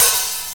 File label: Perfect Open Hi Hat Sample G Key, Royality free open hat one shot tuned to the G; open hat samples